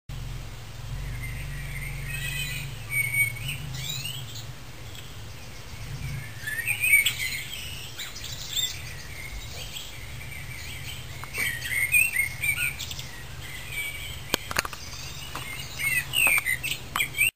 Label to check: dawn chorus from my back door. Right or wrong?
Right